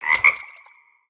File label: Toad of the Wilds, Attack